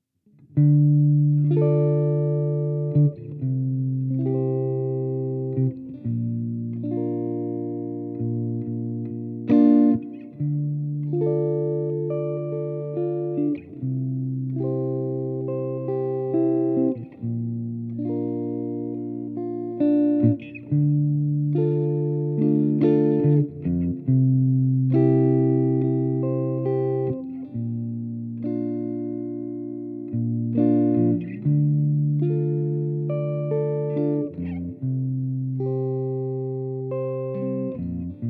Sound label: Electric guitar